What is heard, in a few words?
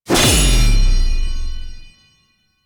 Sword Cut; Duviri; Dioramas; Envy